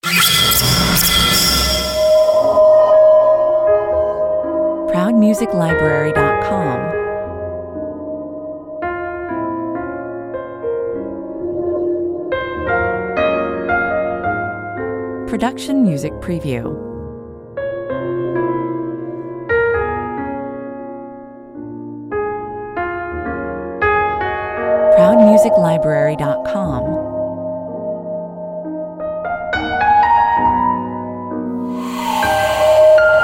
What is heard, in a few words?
horror 138 bpm